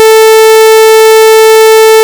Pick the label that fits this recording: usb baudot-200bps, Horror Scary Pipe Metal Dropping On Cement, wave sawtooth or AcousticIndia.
wave sawtooth